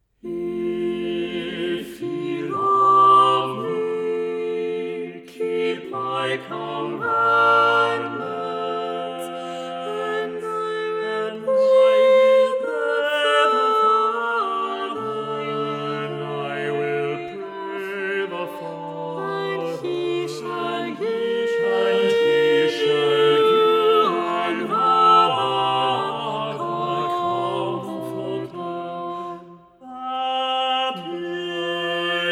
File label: minus alto; if ye love me